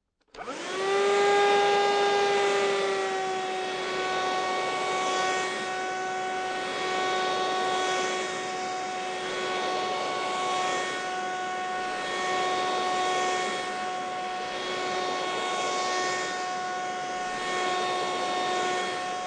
Vacuum Turn On Vacuum Turn Off, Household, Vacuum, Handheld, Dustbuster, Turn On, Vacuum Carpet, Turn Off; Cleaning